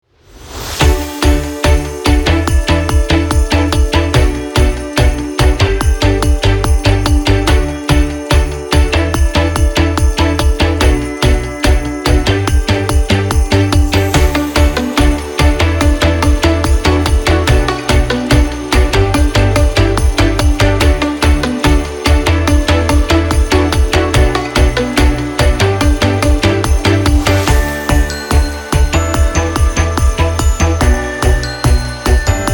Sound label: christmas, happy, uplifting